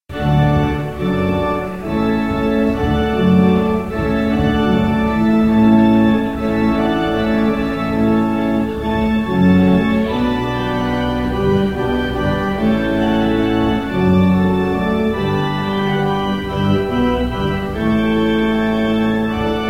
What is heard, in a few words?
Hornpipe, organists